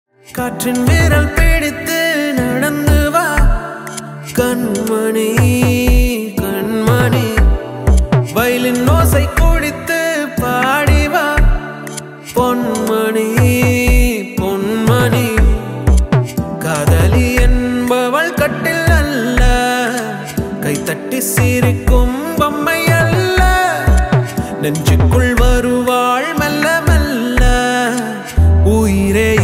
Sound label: Viral Ringtone, tamil; aghathiyaa